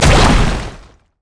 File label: elastic slingshot; LoL; zac base